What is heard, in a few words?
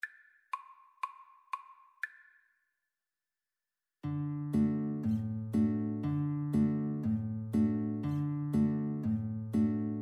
when the saints flute guitar duet